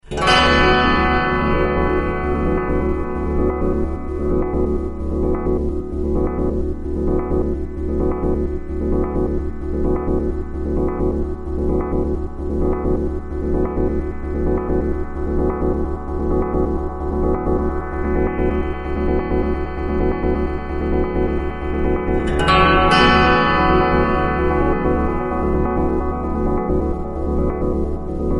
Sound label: ZITHER ELECTRO no tuba